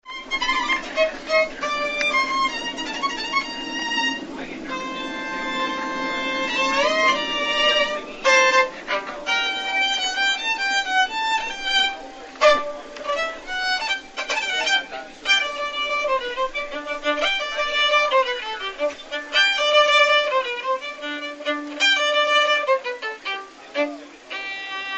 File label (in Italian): Danza Ungherese Violino Romania; Tram 8